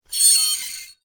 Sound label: Metal Squeak